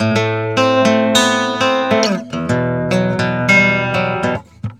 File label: GUITAR SAMBA; Best Service ProSamples vol.14; AKAI; World Grooves